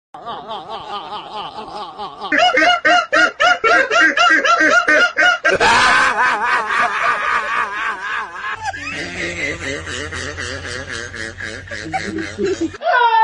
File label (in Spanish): risas contagiosas